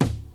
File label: original steel snare drum D key; snare drum samples